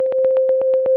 122 BPM Arps A maj G min Tonic C; arp essentials

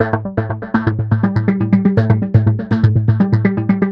122 BPM Sequence Amaj Gmin Tonic F; innovative sequences 1

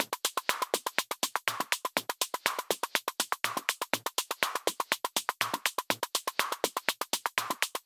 122 BPM Micro Drums; MMB; Percussion Essentials

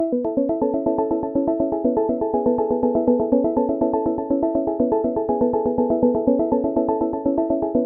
122 BPM Arps Liquid Arps A maj F min Tonic E; arp essentials

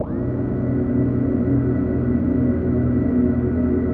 122 BPM Atmosphere A maj F min Tonic E; mmb studio soundtrack essentials

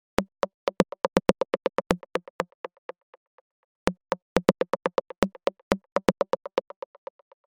122 BPM Rims; percussion essentials 1

